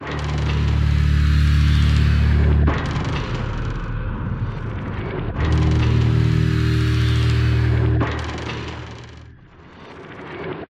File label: Combat Rhythm Only